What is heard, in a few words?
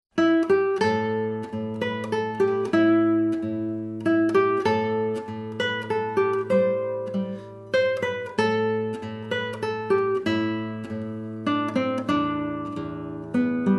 irish flute